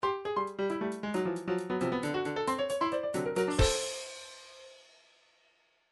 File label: trance midi loops fills sfx; Newtronic Trance Constuction Kit